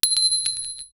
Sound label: coin clink sound short me; items